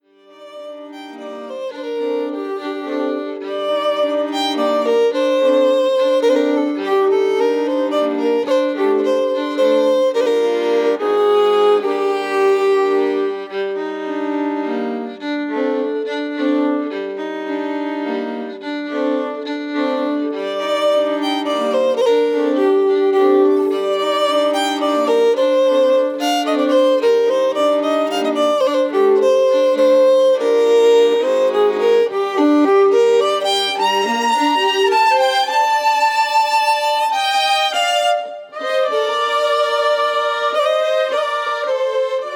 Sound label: Waltz